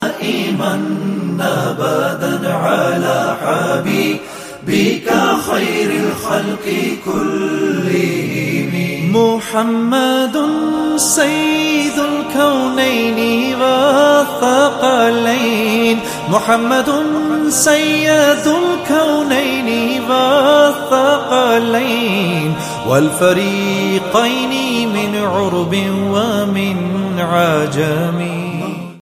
maula ya salle wasallim ringtone, islamic ringtones